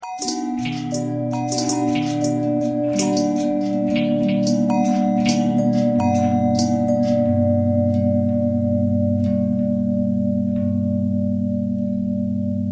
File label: long bell thread pentatonic